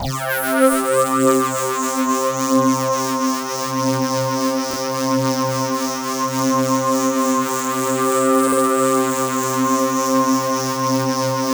RING SWEEP, Pads; Distored Reality 1&2

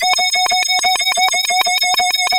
OSCAR G2, synthesizer; Hollywood Edge - Giorgio Moroder Rare Synthesizer Collection

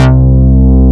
MOOG, Synth Bass, Moog Basses

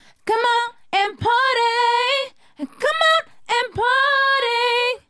Female Group, Hip-Hop and RnB Vocals

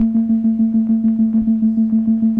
Optigan Keys; Roland LCDP09 Keys of the 60s and 70s 1

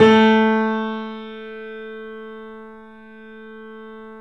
3D Pianos Yamaha C7; E-MU Producer Series Vol. 5

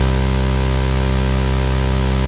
Synth Prophet Bass C; Electronisounds

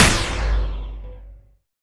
Drums; Distored Reality

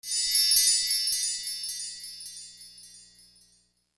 Percussion; Sonic Foundry (Sony Creative Software); Infinite Soul VOL-1

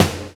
Circulate Snare; Sonic Foundry; Drums & Drones; Construction Kits

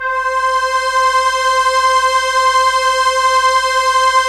STR ARP, ARP Strings, ARP Slow Ens; Roland LCDP09 Keys of the 60s and 70s 1

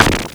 Garbled Snare, MultiMoog

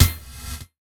Gated Snare; Univerb; creative gate; Gated Reverb

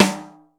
FULL OPEN SD, RY30 KIT; Crypton - DrumBase5000

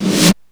REV SD2, Korg Electribe EMX-1

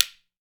WOOD SPOONS; Jew's Harp & Spoons

